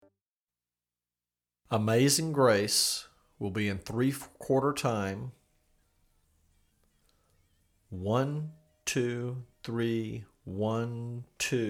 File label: Slow; Amazing Grace